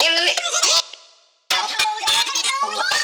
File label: vox